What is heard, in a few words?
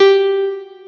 piano